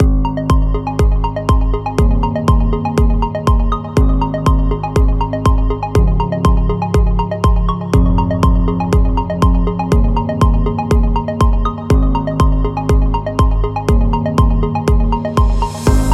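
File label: A Science, Modern technology background music; hi tech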